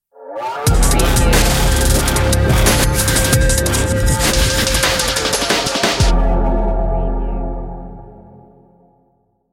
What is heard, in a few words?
underground bass stinger